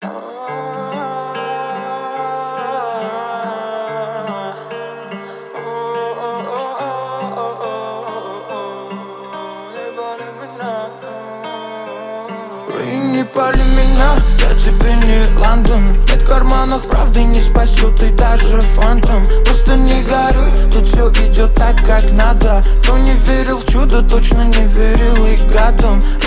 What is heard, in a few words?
33.35.37hz Low bass